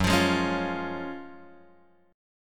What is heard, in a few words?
F sharp Major 7th Suspended 2nd; F#M7sus2 Chord {2 X 3 1 2 1} strummed